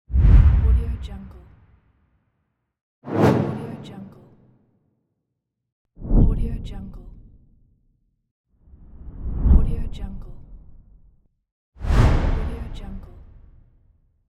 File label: woosh